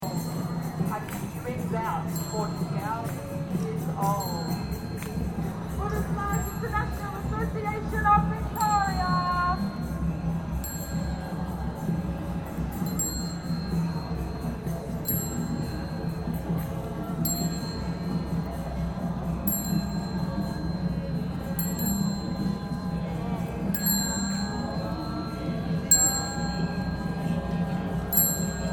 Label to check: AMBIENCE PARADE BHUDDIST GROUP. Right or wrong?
Right